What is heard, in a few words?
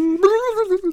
Healer Attack Vocals